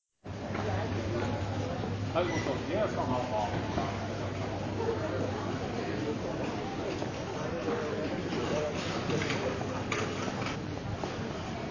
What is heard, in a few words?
Oslo Airport; study abroad